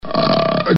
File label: burp